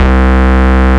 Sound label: Ultimate Bass Root C; Vengeance Samples